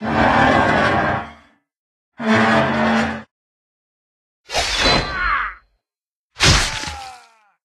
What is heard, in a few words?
Stinger Orderly Blood; silent hill homecoming 2008 gamerip pc ps3 x360; Ambient